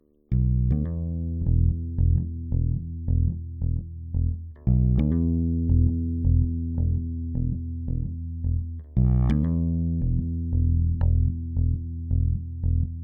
bass intro; How to Serve Man

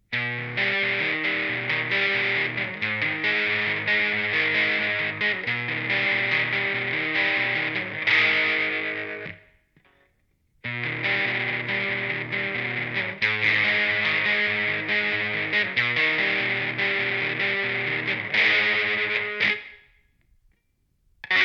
electric

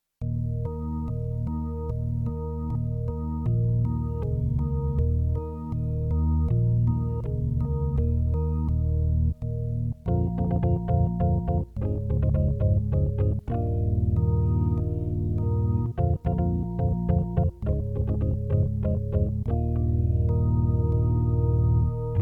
heavy

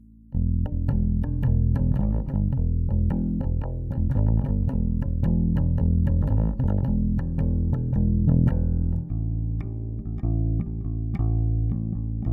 bass riff

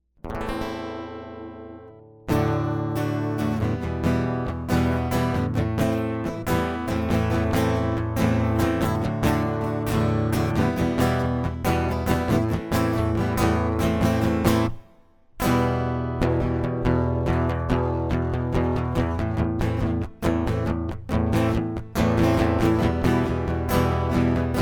acoustic

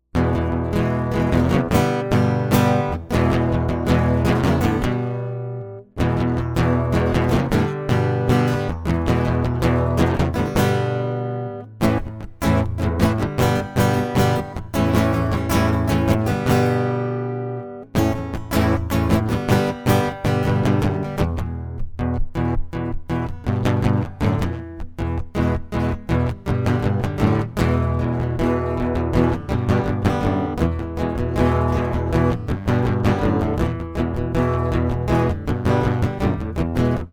acoustic; MegaEpix

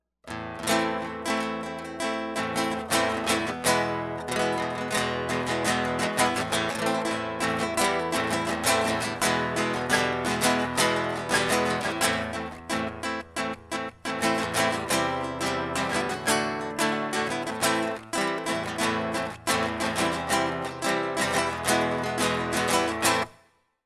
classical guitar; MegaEpix Enormous

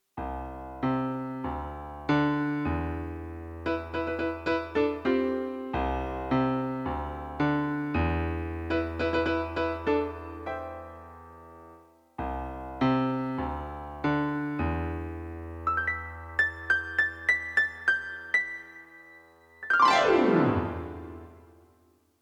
piano; MegaEpix Enormous

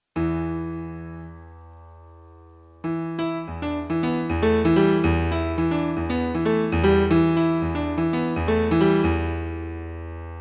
piano

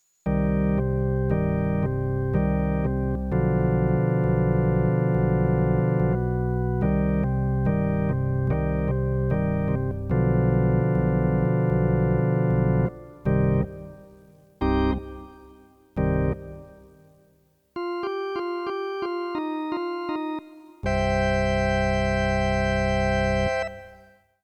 pipe organ

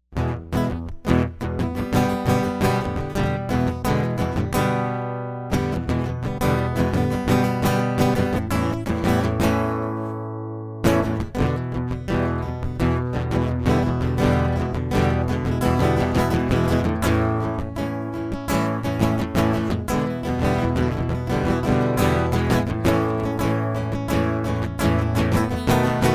acoustic; MegaEpix

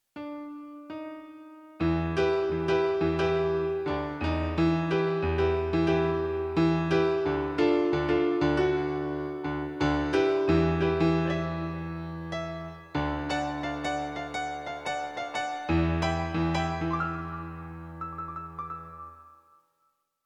piano; The Cost of a Whim

